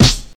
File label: prominent snare drum C sharp key, Loudest frequency: 2120Hz